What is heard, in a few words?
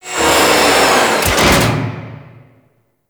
Shipyards Robot Arm Deactivate; Ambience; Props; Warframe; Grineer Shipyards